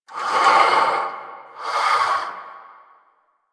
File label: Vocal Breathe; Warframe; Duviri; Dax; Vocal Set A